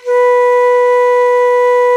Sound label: VENU B3, Old World Instruments, World Winds; E-MU Producer Series Vol. 7 – Old World Instruments; World Combos